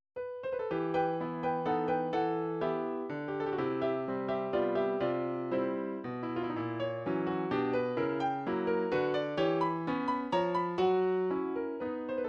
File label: waltz, midi